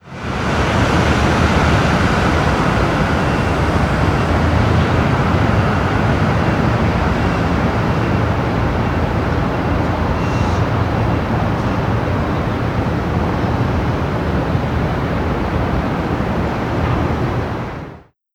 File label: Cities Rumble